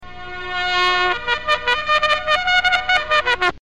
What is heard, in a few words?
trumpet, reverse